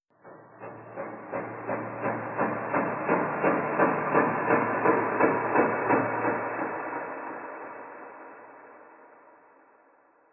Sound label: Wheels